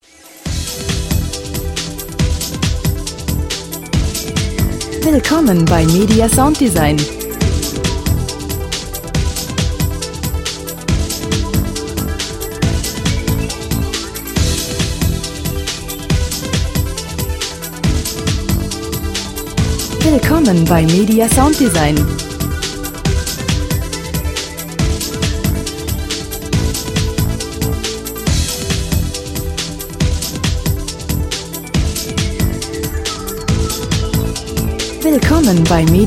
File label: percussion